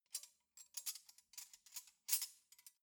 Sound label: cutlery knives handle long